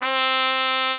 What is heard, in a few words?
mexican trumpet